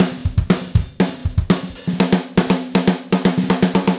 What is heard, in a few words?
Gretsch groove 90 BPM, 1967 Gretsch Kit drums